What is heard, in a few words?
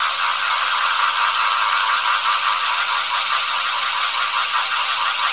Tree Spinning